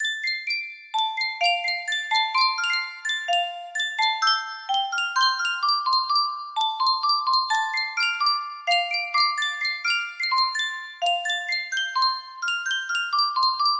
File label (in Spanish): Yunsheng caja de musica, music box melody